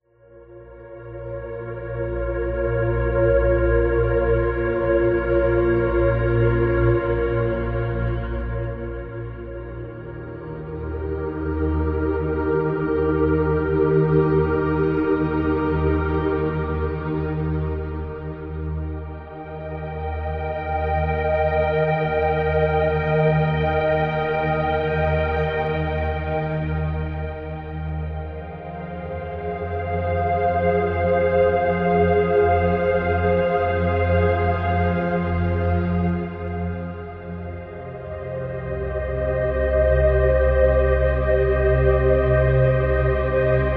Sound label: Meditation Ambient